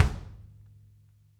kick, drum kits

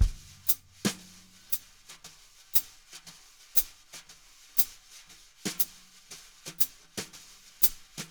jazz brush loops

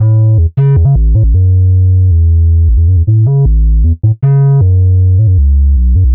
Synth Bass

